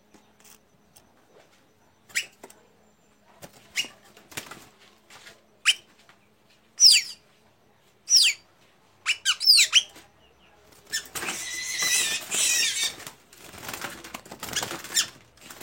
canto da pipira da amazonia

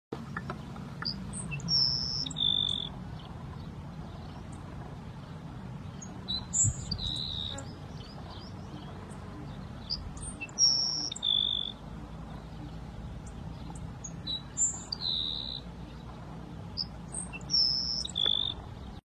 Canto do tico tico do campo

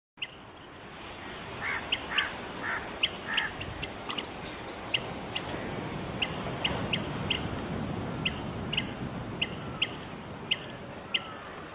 canto do abelharuco de cauda azul

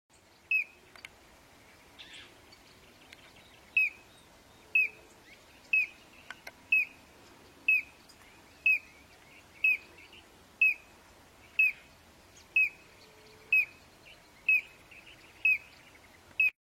Canto do meia lua do cerrado

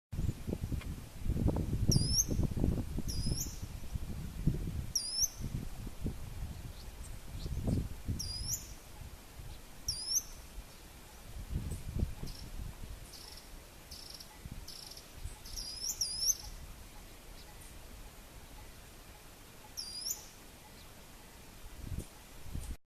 Canto do bico chato amarelo

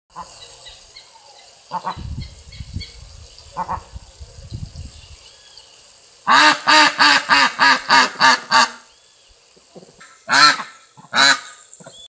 Canto do marreco

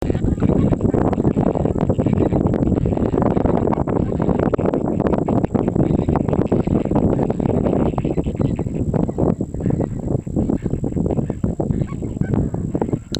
canto do flamingo chileno

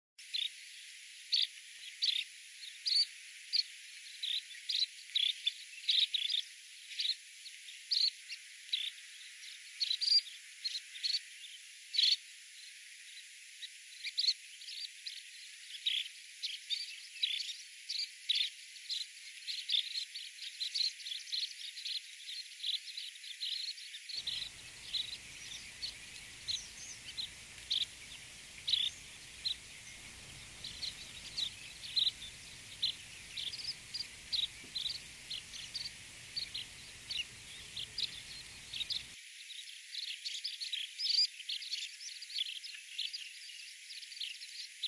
Canto do pilrito de bico comprido

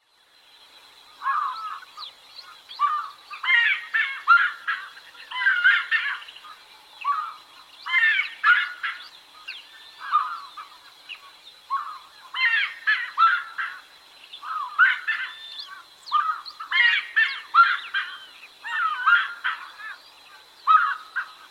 Canto do saracuracu

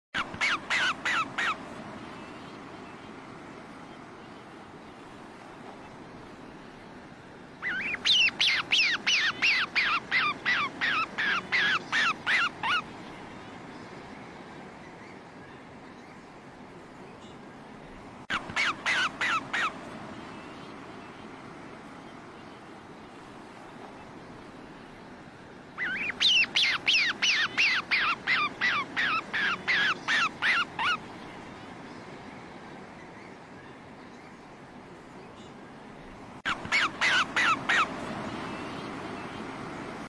canto do painho de ventre branco

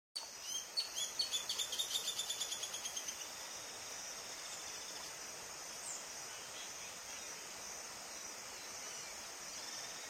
Canto do barbudo rajado

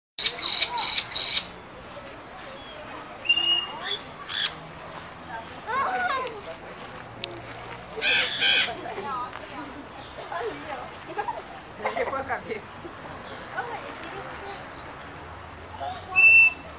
canto do marianinha de cabeca amarela

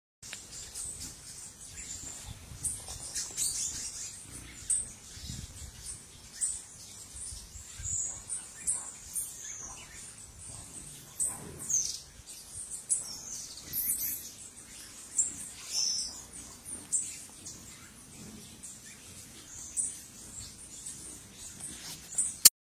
Canto do topetinho verde

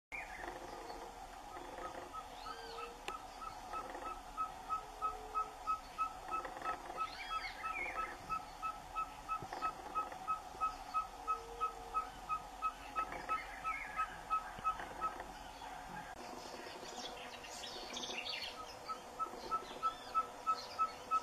canto do cabure

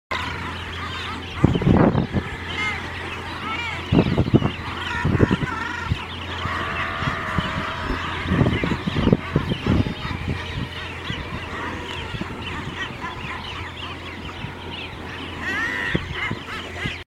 canto do papagaio do mar com chifres

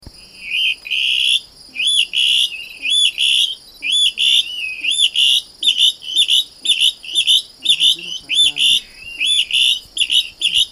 canto da saracura do banhado

